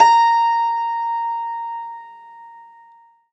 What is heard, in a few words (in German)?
HACKBRETT; Alpine Volksmusik